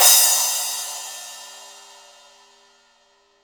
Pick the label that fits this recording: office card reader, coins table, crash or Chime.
crash